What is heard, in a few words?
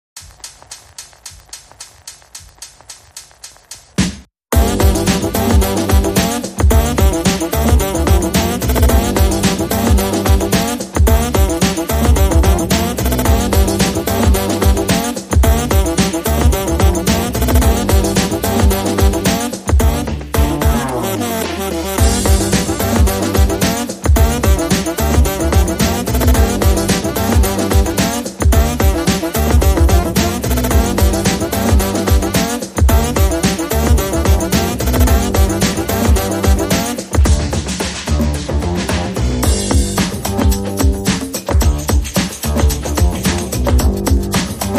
Drums and Bass and Sax